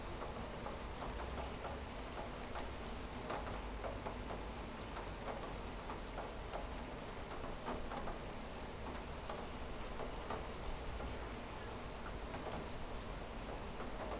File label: rain window; 99Sounds; Rain And Thunder